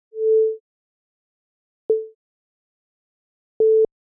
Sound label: grain envelopes, Gaussian, percussive and square grain envelopes; FFT representation of Audio 7: Gaussian, percussive and square grain envelopes; Spectralism and Microsound